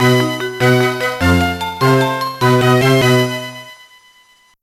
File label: Level Completed; SpongeBob SquarePants Creature from the Krusty Krab GBA